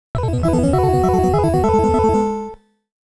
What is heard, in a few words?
Round Clear; hucard disc in taito vol. 2